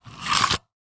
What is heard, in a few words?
Electronic Camcorder Removing Tape From Tape Deck; Panasonic Dvx100